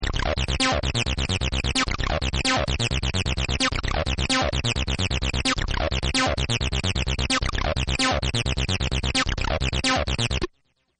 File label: Synth 303; Buttons; Sound Effects:Multimedia